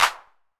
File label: Clap 808 Color, Hand Clap